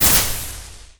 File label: Sizzle Laser Hit Water; SFX Kit; Creaks, Scrapes, & Misc